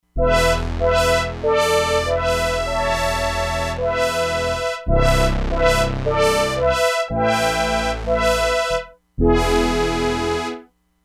SYNTH BRASS, Crumar Bit 99; Factory Programs

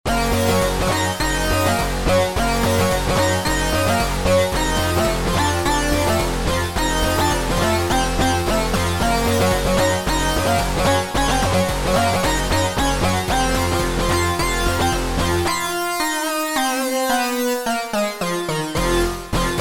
T8 Sync; Roland SRX-07 Ultimate Keys

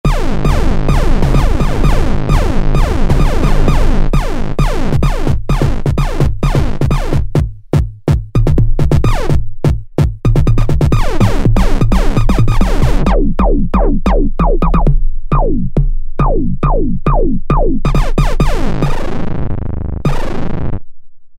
Pc-Tornado BD, Yamaha EX5R; Factory presets

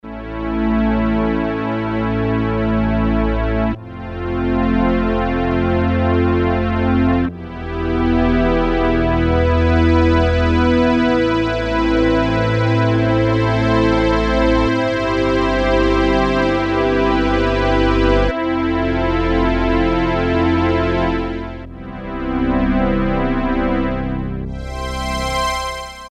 String Pad, Roland W-30; Factory Performance Patches; Factory B

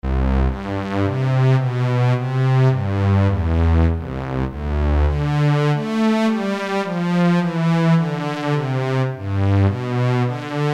CELLO, Roland Jupiter-8, Factory patches

